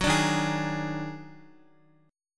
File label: Eb Minor Major 11th, down, Guitar; strummed; 4F,4A,5C,5G,5C,6E; chord; JGuitar